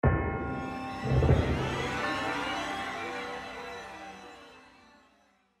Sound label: Battle Loose; willy nilly knight macos windows gamerip 2017